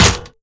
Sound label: impact, metal bicycle; Half Life Alyx; props